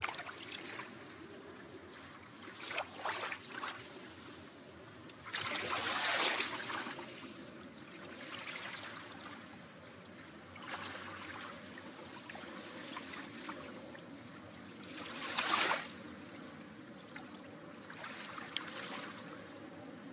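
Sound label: Atmosphere, G minor, 95 BPM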